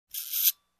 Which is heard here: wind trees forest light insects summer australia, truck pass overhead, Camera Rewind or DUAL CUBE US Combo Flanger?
Camera Rewind